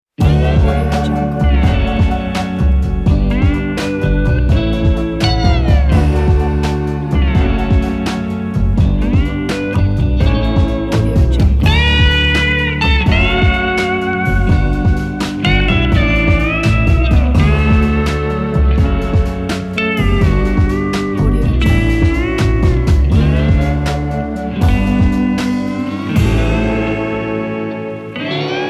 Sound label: sleepy surf